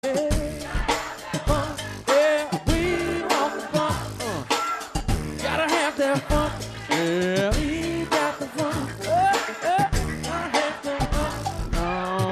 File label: Funky Beatbox